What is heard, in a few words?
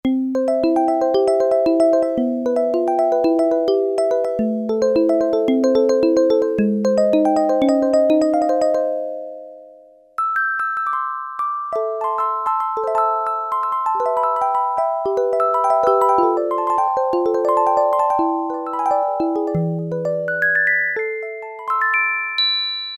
Marimba, Kawai K1m